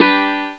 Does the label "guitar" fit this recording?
Yes